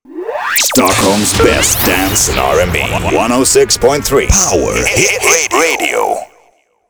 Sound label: Power Hit Jingle; Project Gotham Racing 2; radio; Power Hit Stockh